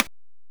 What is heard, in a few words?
Rimshot; Minnesota Fats; M8; Genesis